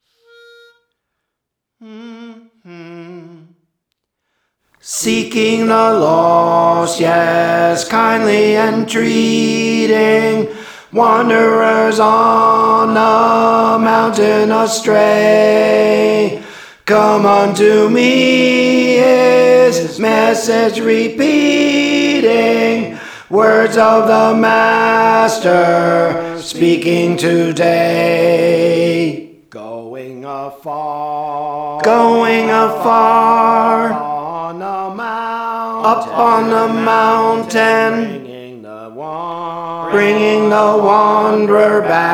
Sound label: Tenor; Praise For The Lord Training